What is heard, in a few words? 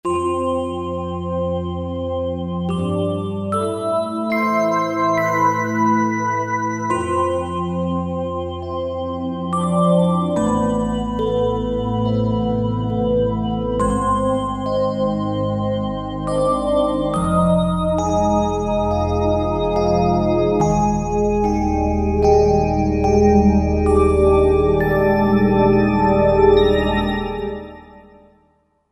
Bell Pad, Roland JV-2080